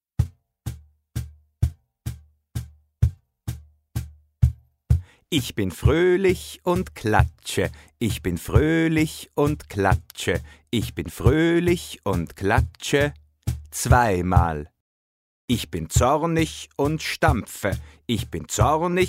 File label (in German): Gefuehle Rap